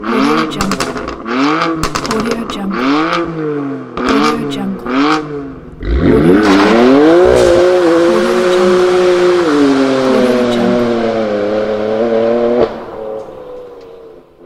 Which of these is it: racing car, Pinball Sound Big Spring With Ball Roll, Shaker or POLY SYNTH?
racing car